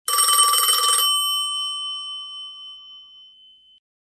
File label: Old Telephone, ringtones; Infinix Hot 12 Play